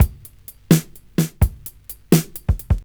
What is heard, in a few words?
OLD SCHOOL, Breakbeats; USB Soundscan vol.46 - 70 s Breakbeats; AKAI